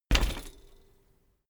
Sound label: bicycle bike land rattle back